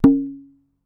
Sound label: Kendang, Gamelan